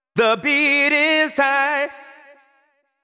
deep house male, 125, A, vocals

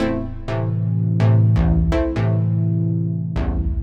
DEEPHOUSE ORGAN 125 A

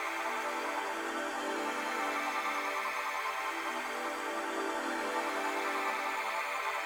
SPACE PAD 140 A; SpaceSounds

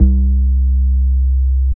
BASS MONO

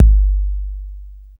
808 LONG, bass drum; BASSDRUMS

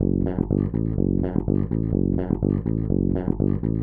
DEEPHOUSE BASS 125 A

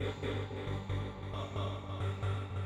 SYNTH PAD, INDUSTRIAL; Sphere

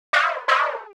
HOUSE FX 125

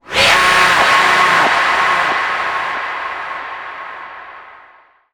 TECHNO FX 140

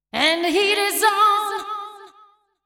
deep house female, 125, A, vocals

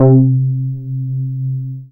BASS MINIMOO

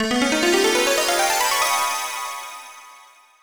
TECHNO EXTRA 140

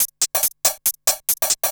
TECHNO HIHAT 140

